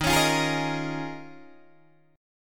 Eb 9th Suspended 4th Eb, Guitar Open D; down

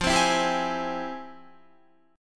F Minor Major 9th, Guitar, chord; FmM9; strummed

